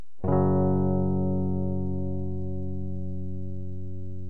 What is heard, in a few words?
low chord